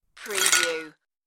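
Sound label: Dropping Ice in Glass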